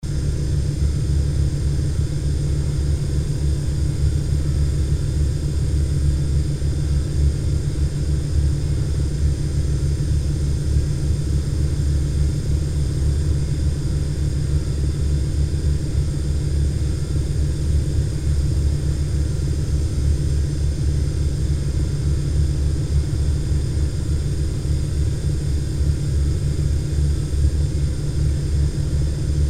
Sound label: transmitter control room